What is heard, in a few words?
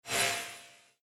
roll